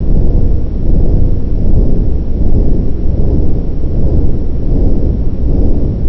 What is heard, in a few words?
fan